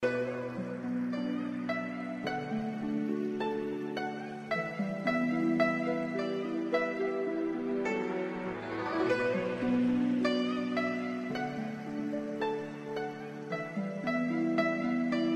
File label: Instrumental; Nhạc-Niệm-Phật